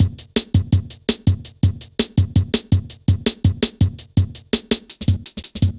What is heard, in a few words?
Skippy, Drum Loops, Jungle; Zero-G Jungle Warfare 1, 2 & 3; Skippy A 165.5